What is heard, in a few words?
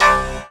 Dance Mega Synth; Best Service; 90 s Sample CDs